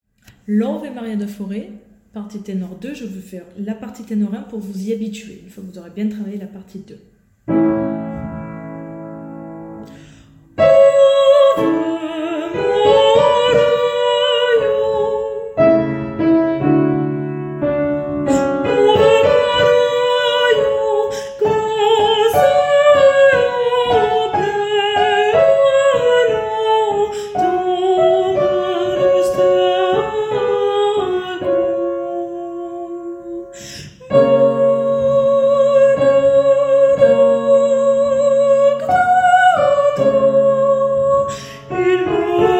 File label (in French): partie tenor 2 avec tenor 1; Ave maria